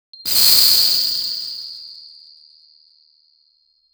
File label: flash powder with dark